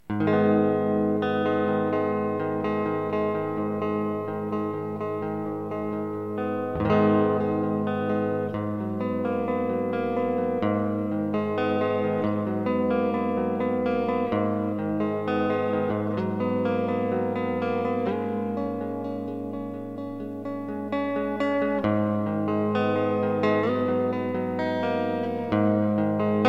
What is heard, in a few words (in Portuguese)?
folclore